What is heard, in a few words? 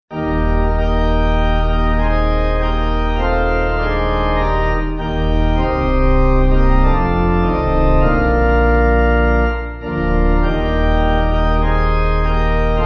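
Pipe, Pipe Organ; From Thee All Skill; Land Of Rest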